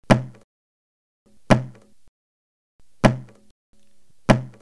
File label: Dhet Bol